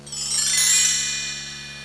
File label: enter; secretmother